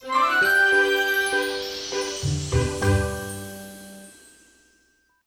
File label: victory